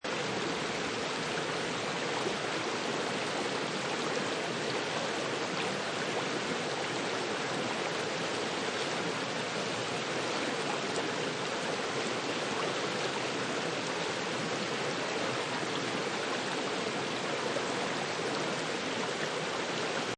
River Or Stream Medium, An even flowing river running at medium spe; An even flowing river running at medium speed. Seamlessly looping sound.; River Sound Effects; Lynne Music